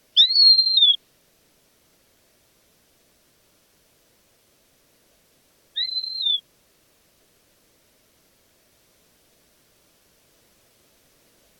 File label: Buteo albigula, roofvogels; Witkeelbuizerd; Accipitrinae; Accipitres; Accipitidae